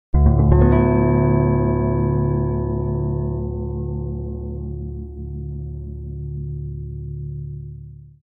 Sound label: Electric guitar open strings out of tune